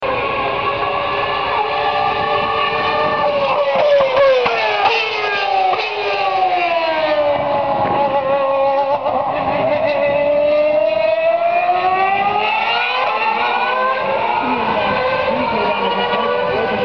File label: F1 Car Braking